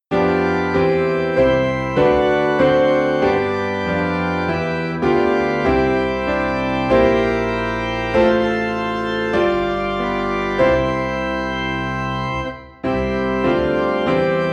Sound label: Piano and Organ in C; May Jesus Christ Be Praised